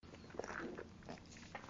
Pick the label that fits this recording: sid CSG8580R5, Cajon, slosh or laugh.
slosh